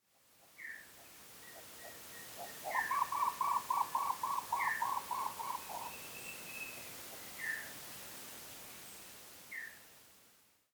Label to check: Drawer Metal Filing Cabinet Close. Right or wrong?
Wrong